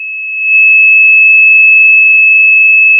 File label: Sound of a tea kettle whistling